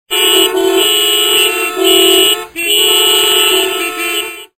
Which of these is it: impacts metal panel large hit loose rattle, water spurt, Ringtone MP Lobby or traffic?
traffic